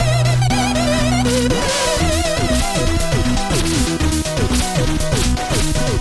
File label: music forest boss intro